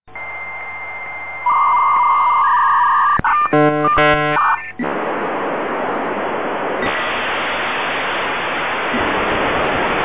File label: dialup modem 14400bps 33600bps